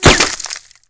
impact wood; Modern Warfare

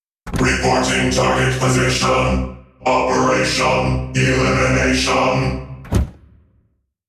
ambient port; World Of Warships; port3d

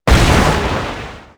explosion; buraq

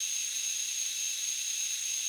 bow arrow flight; Rust

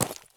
run slate; Escape from Tarkov

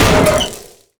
metal scrap large impact

